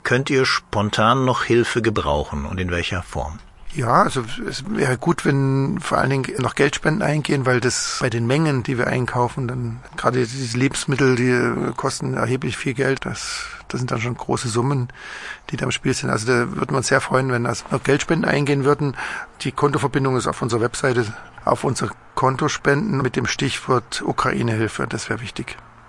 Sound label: classic brass